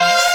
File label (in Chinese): ZUN号 开头